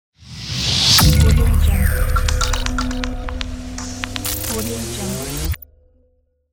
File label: impact logo